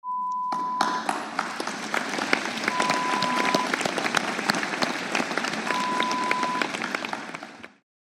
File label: Applause Large Hall